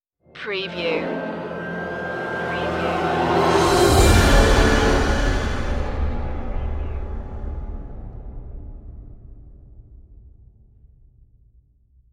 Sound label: hells chant stinger